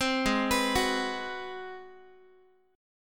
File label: Ab 7th Sharp 9th Guitar, chord; 15,16,14,16,x,x; arpeggiated